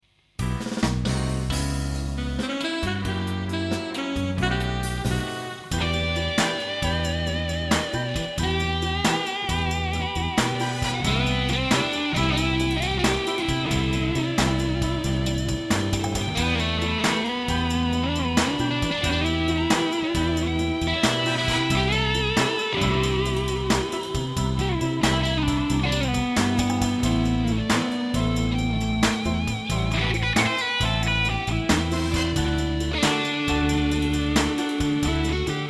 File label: VOX Satchurator blues ballad